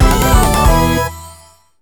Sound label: JINGLE MAP GET; maimai